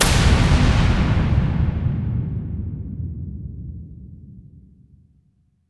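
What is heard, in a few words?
MONDO BLAST, Impacts, Hits & Booms; Distored Reality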